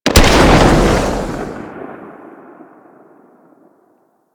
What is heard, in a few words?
Torpedo Explosion Underwater, weapons; Grn Torpedo Pistol